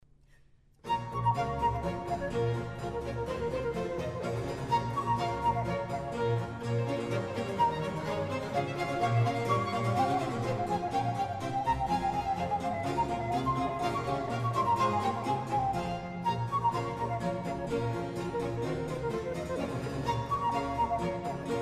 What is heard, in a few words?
Orchestral Suite No. 2 In B Minor, Badinerie; Bach